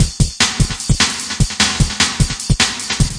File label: 150 gated snare breakbeat; 141 to 160 bpm